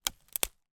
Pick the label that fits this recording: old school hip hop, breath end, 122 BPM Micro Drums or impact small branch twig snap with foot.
impact small branch twig snap with foot